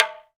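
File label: Talking Drums; Roland - LA Composer vol. 1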